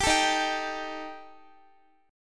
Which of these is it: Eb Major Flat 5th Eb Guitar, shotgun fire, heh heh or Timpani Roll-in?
Eb Major Flat 5th Eb Guitar